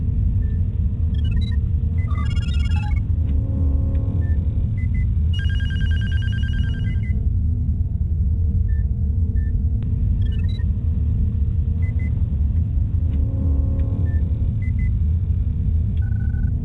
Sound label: Amb Computer; Fur Fighters; Windows gamerip 2000